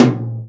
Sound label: tom, Real Drum Kit, jungle kit